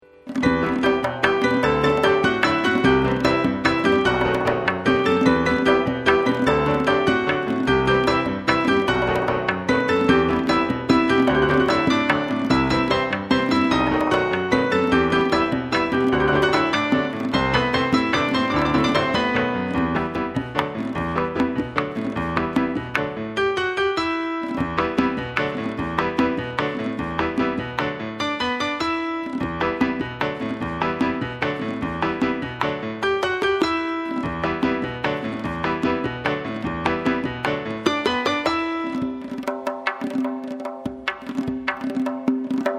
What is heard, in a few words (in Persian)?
Raghs Baa Piano Va Tonbak